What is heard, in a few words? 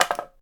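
Log Stone Hit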